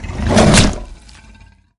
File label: npc dragon attack bite